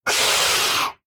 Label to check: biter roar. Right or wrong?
Right